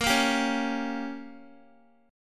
A sharp Minor 6th A sharp, Guitar 4F,4A,5C,5G,5C,6E; A#m6; strummed; x,13,10,12,13,x